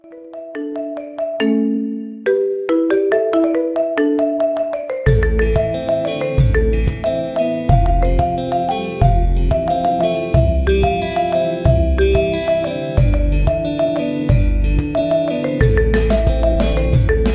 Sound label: marimba remix ringtone